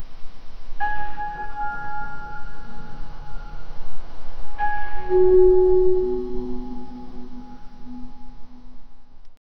old house ambience

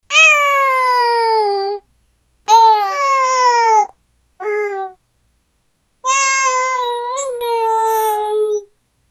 child happy sound

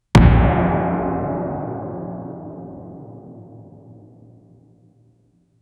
trident hitting stone flo

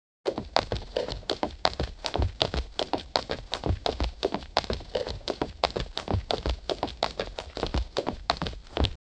heavy and fast footsteps

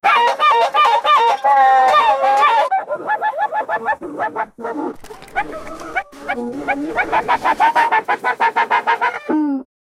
comedic sound effect of m, farm

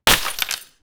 break wooden rod

